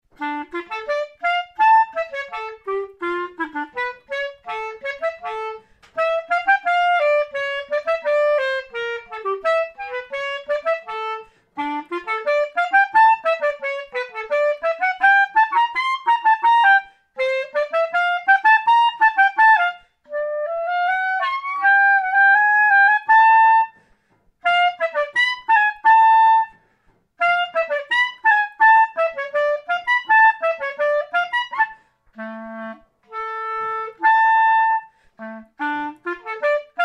Allegro Klarinette; Baermann

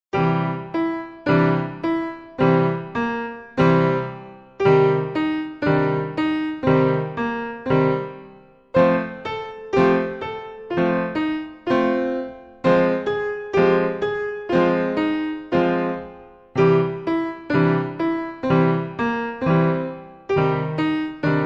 Dur Moll Pop

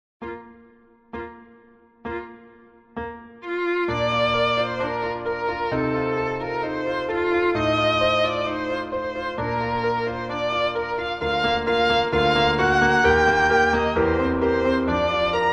Violine Piano; Beethoven; Liebeslied

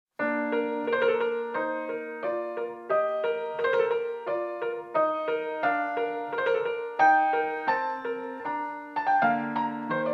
Praeludium mit FX; J.S.Bach; Partita1 Bb

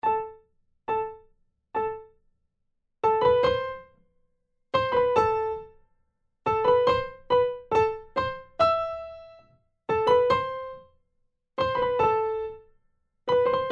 Primo, Vierhaendige Uebungsstuecke; Playbacks; Diabelli

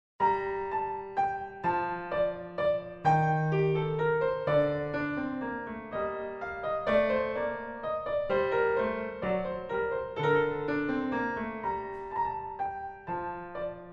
Menuett G-Moll (schnell); Bach Johann Sebastian

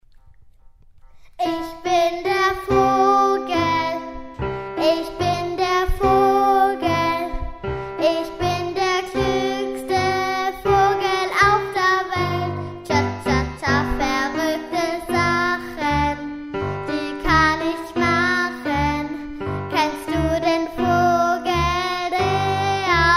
Gesang Klavier; Ich Bin Der Vogel

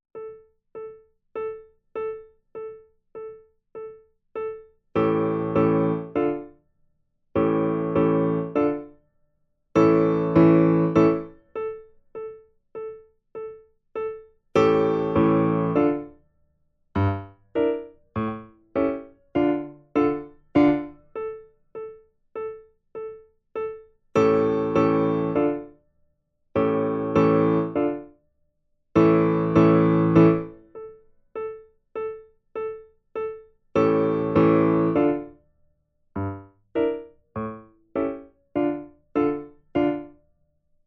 Secondo, Vierhaendige Uebungsstuecke; Playbacks; Diabelli

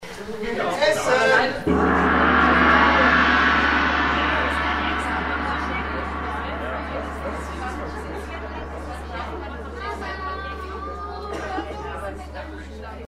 Gong; Verabschiedung; Schwarzenbach; Essen

